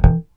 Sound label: bass 3 (E) (mute); Sonic Foundry (Sony Creative Software); Ugly Remnants VOL-2; Basstuff